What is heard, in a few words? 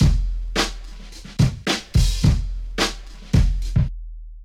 Drum Break Hip Hop; Ableton; Breakbeats by KutMasta Kurt